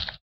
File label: slip slap; Spectrasonics; Metamorphosis